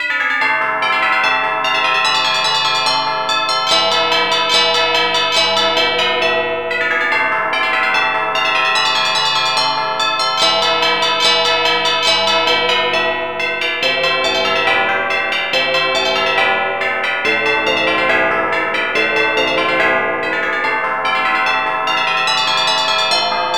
tubular bells

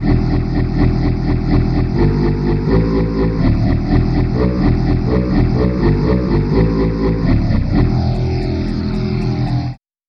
accordian bass

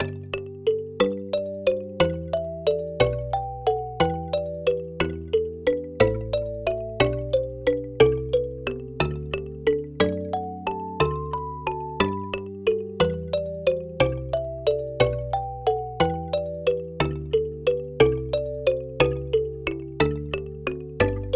kalimba

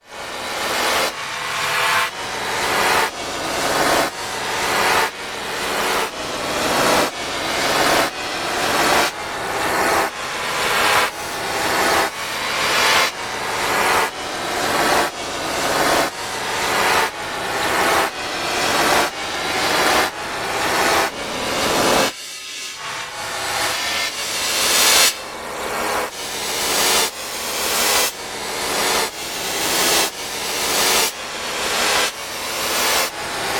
reverse cymbal

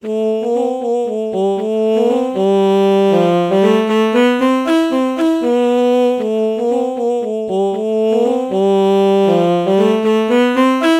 alto saxophone oh melody morph low

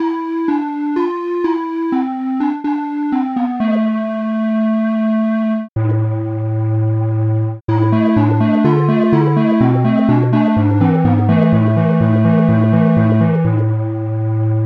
ocarina

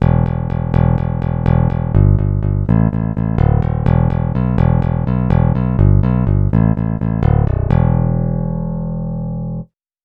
picked bass

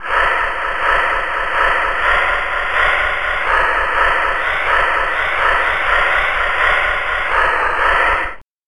breath noise bass

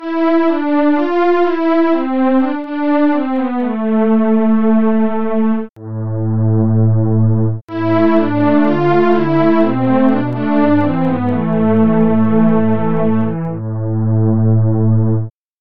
sweep pad

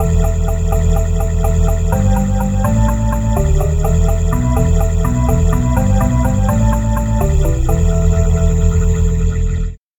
bowed glass bass

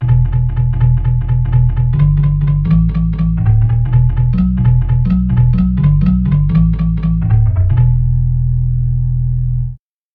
percussive organ bass samples

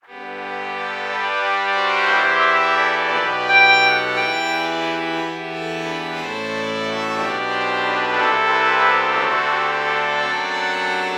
trumpet violin lpc true bach morph

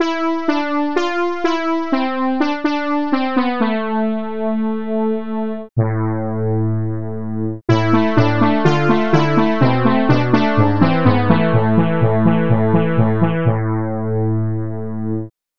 chiffer lead

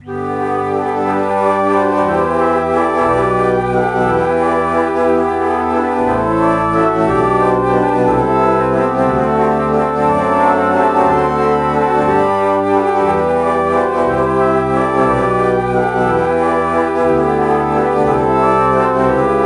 alto flute alto flute morph

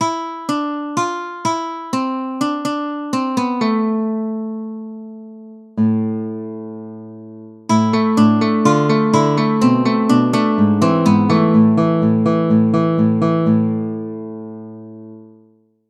nylon string guitar

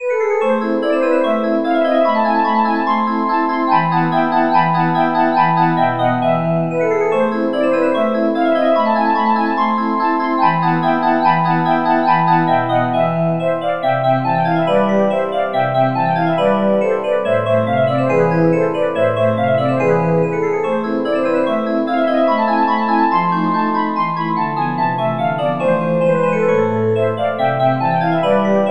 bowed glass

